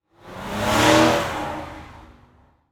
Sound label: Transportation Car Race Car Drive Off